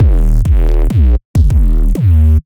Phase Plant, bass

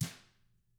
TAMA BR P, Drums; ILIO; Double Platinum Drums 1

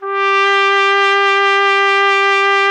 BRS TP G, TRUMPETS; Roland - LA Composer vol. 1

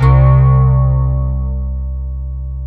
stringer C2

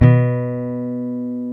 Jazz Guitar

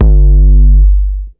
base drum; DRUMS

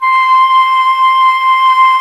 FLU, Flute; Sound & Vision - Gigapack I CD 2 (Roland); Brass&Woods2

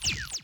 crash, Millenium MPS-400

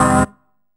HOUSE, House Dance

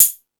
High Hat, Drum Machines E-MU Pro-Cussion

